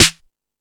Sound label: Dance 2000, Drum Machines E-MU Pro-Cussion